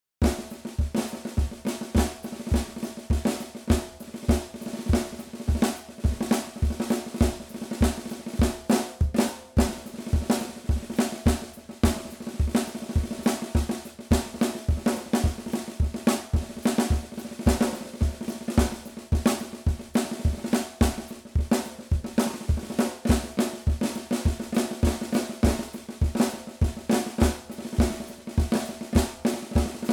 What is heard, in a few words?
snare; aerodrums; doctor beat